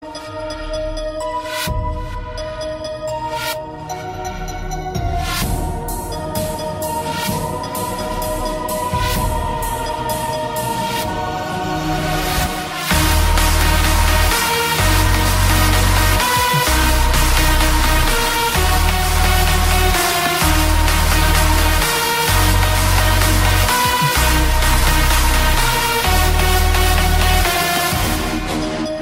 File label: COOL ELECTRO